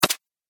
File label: Archery Elf Bow; Bow And Arrow Sound Effects; combat sound effects